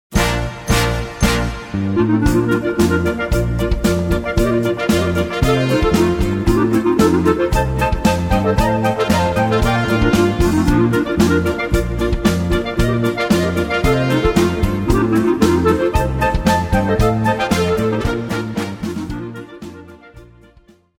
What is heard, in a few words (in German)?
klarinetten